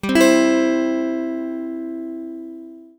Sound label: C chord